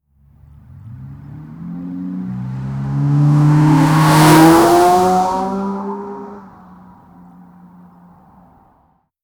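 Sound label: a powerful sports car launch; automotive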